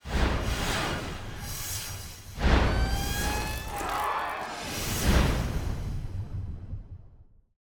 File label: SFX; NewsOneNow; Moving